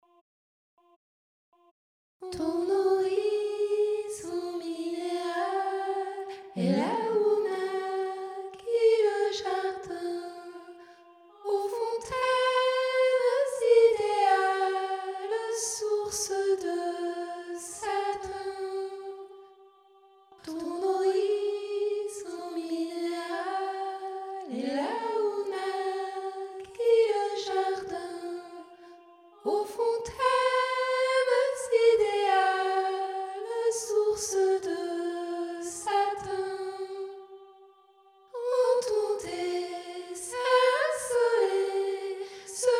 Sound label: chorale soprano